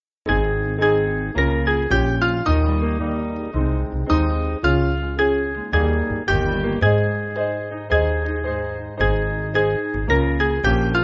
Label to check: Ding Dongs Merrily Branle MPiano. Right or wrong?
Right